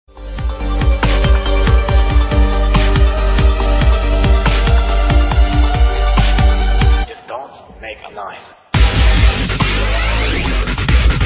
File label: dubstep ringtone